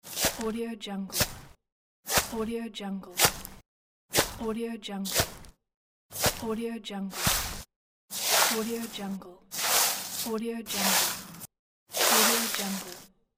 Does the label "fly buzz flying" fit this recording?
No